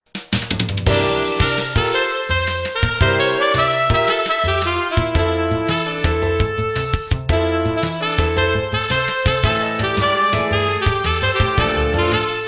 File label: Jazz It Up; Nokia; 7710 WAP Asia